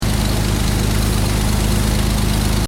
Engine: Tick Over Revs Loop, car; Cars; Vehicles; Stuart Duffield

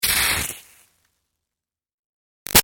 Sizzle Iron Electrical Zap; Zaps; Electronics

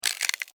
Insect Move Click, Insect, Movement, Clicks, Bug; Sound Effects:Animals:Insects

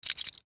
Vise Grip Pliers, Vise Grip Pliers Handle Rattle; Hand Tools; INDUSTRY

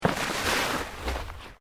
Plant Leaves Large Move Slow; Material components for constructing more complex sounds; Rustling

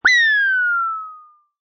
Retro Scifi Hit; One hit of a retro machine or motor start and stoping rotating very fast, or a shot of a strange gun.; Science Fiction Sound Effects; LynneMusic

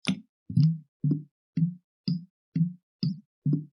Bottle Pour Liquid Gurgle; Foley; Pouring

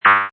Rubby, Button sound; Multimedia

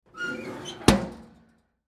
Stove Lid Close, Stove Lid Close Antique; Historical Antique Appliance; Household; Blastwave

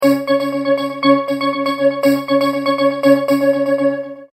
Multimedia Tone-Thinking/Processing; McKinney Sound

